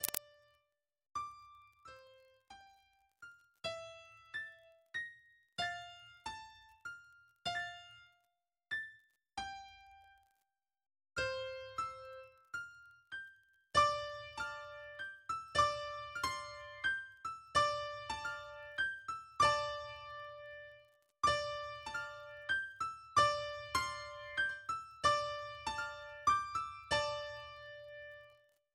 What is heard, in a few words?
music box midi; Vampire in the Garden